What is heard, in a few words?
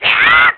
Spin Attack; Link's spin-attack shouts; Voice #3: Ocarina of Time